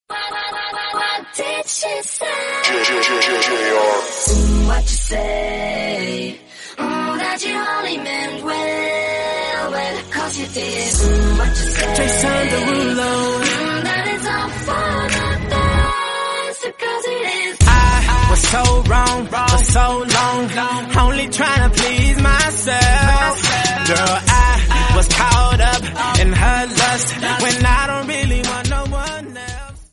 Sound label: ringtone instrumental